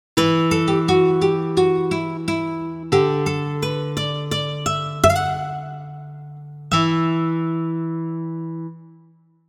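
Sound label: Classic Guitar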